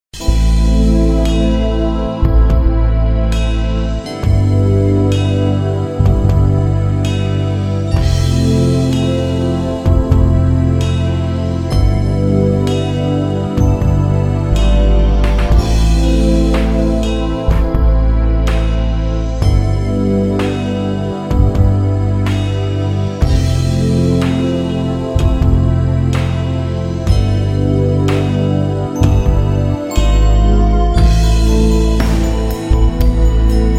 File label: ambient